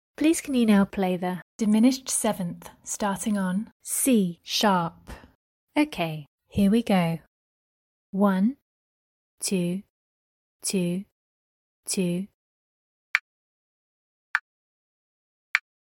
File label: dimin 7th starting C sharp